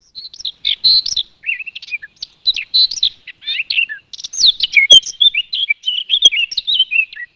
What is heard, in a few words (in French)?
fauvette a tete noire